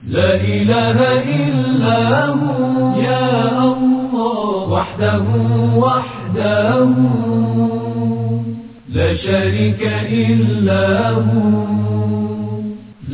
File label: prayer ringtone